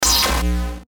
Glitch Surge Electrical, Glitch Digital Distortion Stutter; Production Element Imaging Element Accent Transition; Blastwave